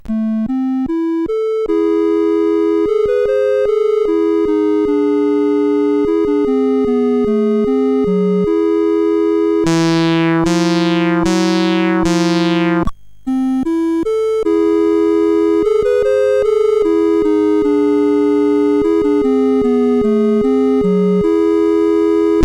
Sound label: 8Bit Goblin, CSG8580R5; hvsc